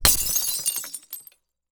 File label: Impact Beer Bottles Cinder Blocks Smash